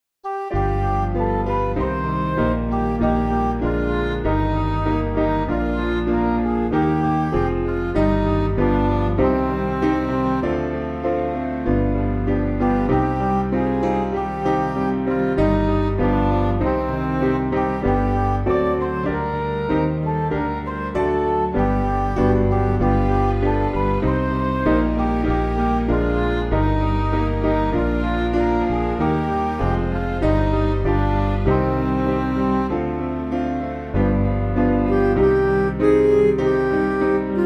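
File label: Music Backing Files for Churches; Sing Now With Joy; Adon Olam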